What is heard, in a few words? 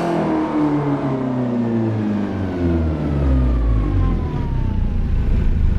throttle off, vehicles; scirocco; gmod; tdmcars